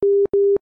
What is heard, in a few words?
Tone Call Waiting